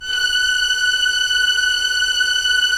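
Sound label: Strings Violins; Roland - LA Composer vol. 4; 90 s Sample CDs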